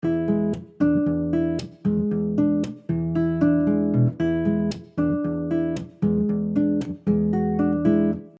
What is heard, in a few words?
Percussive E7 115bpm, Soft Nylon Guitar; MG Soft Nylon Guitar - MIDI Pack